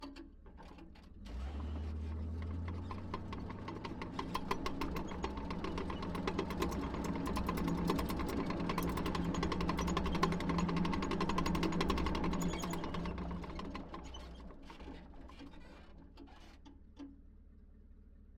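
Stuart M5A1 Light Tank onboard fast drive short stop tracks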